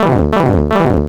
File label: Warp Pipe Giant; Wii